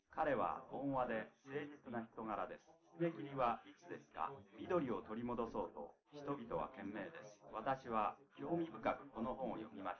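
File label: male, live recording 250ms 5cm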